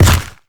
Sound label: step heavy, zombie blind; Half Life Alyx; creatures